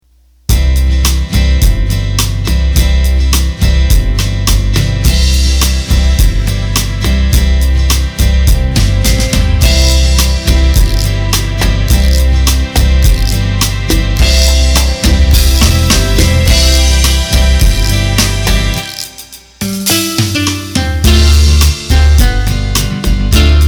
Rumba, Roland accordion; roland bk7m

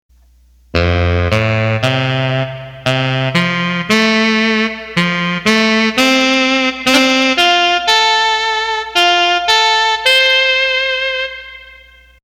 Rock Saxophone

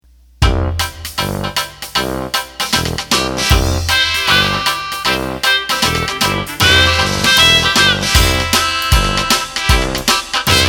Dixie, accordion; roland bk7m

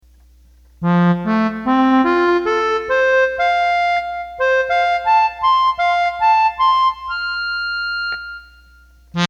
Accordion

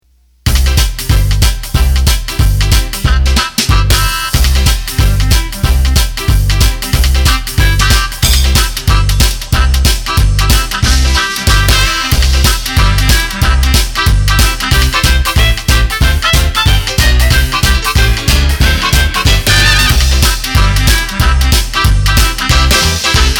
Charleston, accordion; roland bk7m

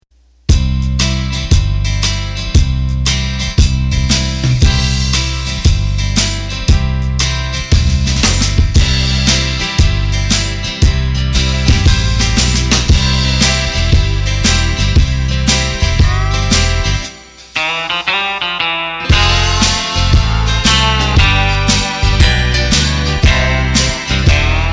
Country; roland bk7m

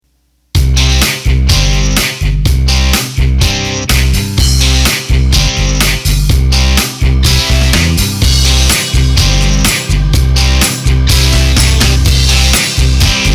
Rock; roland bk7m

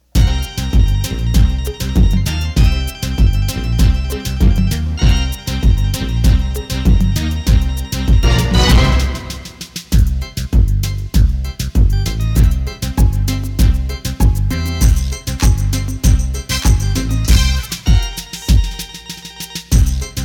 accordion

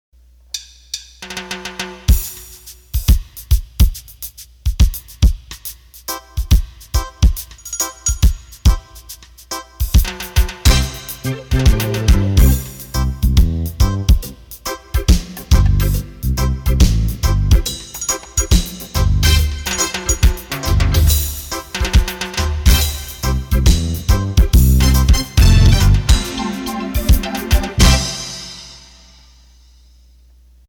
Reggae

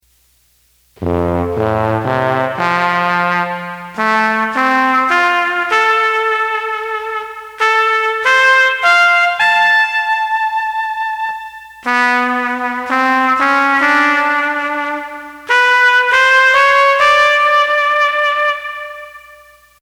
ketron Trumpet; Trumpet Golden

